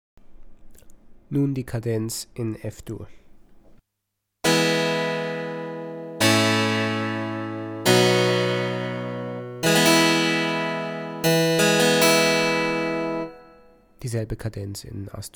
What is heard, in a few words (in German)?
kadenz; Bach